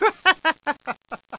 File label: laugh